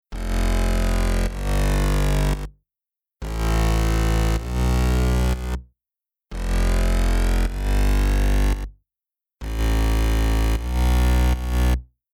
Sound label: FutureBass Bass Main Sub